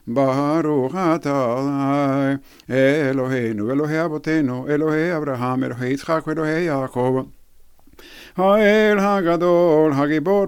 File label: Amida barukh magen Abraham